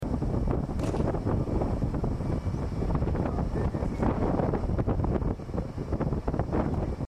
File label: wind brazil